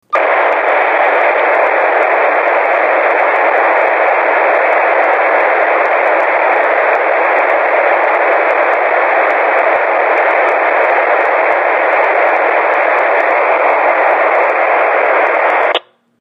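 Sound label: VSELP RAW DES-XL, radio sounds; These two new samples are of VSELP being used on simplex, and simplex with DES-XL encryption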